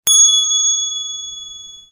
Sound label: Long Holiday Chime Alert